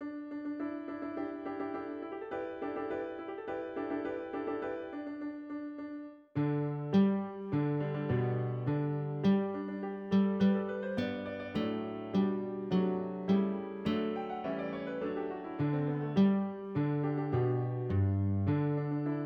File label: hymne national australie; mccormick peter dodds; advance australia fair